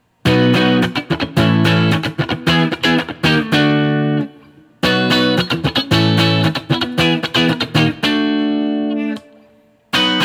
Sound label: Guild S300 E Barre